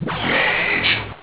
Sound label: shrink; VitalWatch; mage